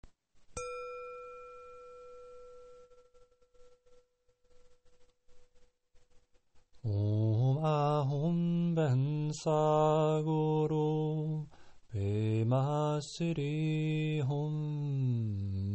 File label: Om Ah Hung Benza